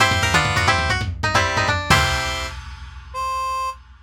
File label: silly success fanfare short